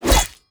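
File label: BOSS Attack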